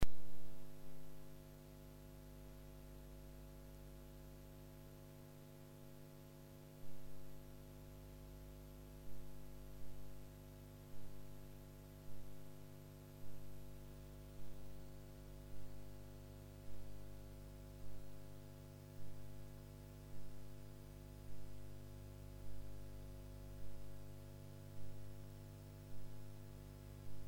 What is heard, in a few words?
Train City Park; SoundLab Views